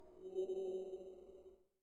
Fly By

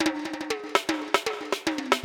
Drumline Fill

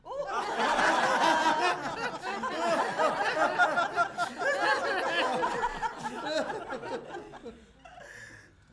Laughing Audience Laughtrack

